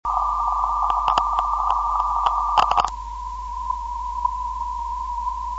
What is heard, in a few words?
25 2khz